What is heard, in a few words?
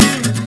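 guitar string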